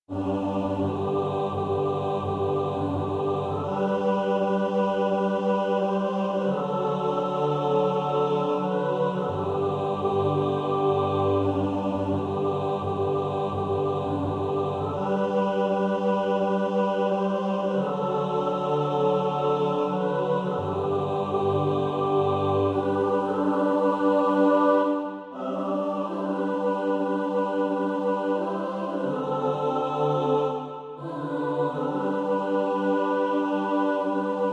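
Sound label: mixed, practice music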